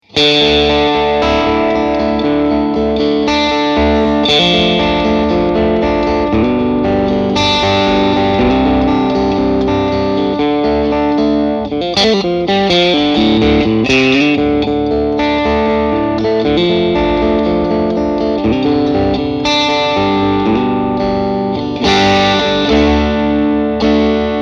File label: Tone Master FR 10 Vox; Fender